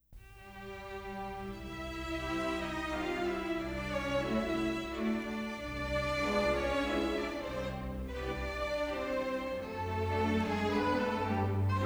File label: Mazurka